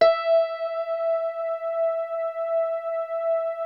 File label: E4, synth pluck; HEAVEN; Music Store - Claudius Diemeter Schwerlos